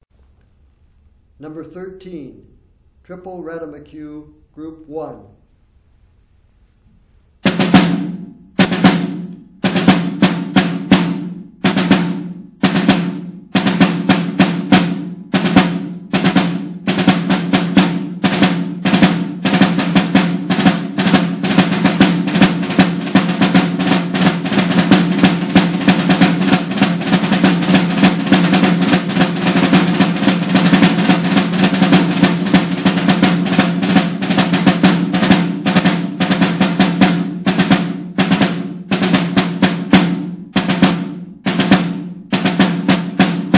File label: snare rudiment